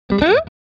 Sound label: Electric guitar slide